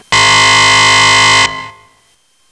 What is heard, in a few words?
buzzer